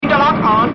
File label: Interlock On